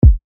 kick; TRUTH-HZ; Crowdsourced Sample Pack; Drum Kit; Kicks

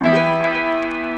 Piano 77BPM Am; Piano & Keys; Crowdsourced Sample Pack

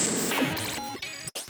glitch, Digital FX; TRUTH-HZ; Crowdsourced Sample Pack

